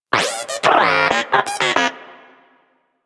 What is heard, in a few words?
Moa Inquisitive Vocal Enemy Killed, Pets; Warframe